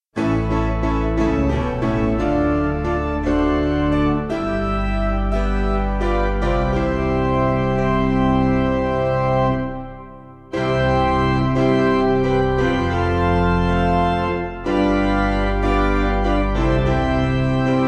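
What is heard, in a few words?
Organ Piano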